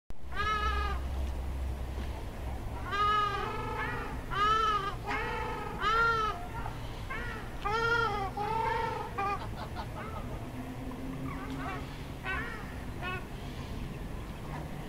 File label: Crested shelduck; پرنده تنجه کاکلی